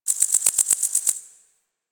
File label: Cricket; Warframe; Quests; The New War; Ambience; Zariman